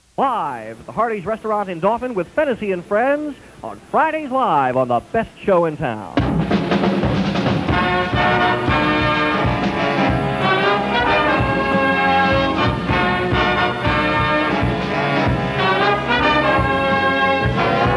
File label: Jazz Ensemble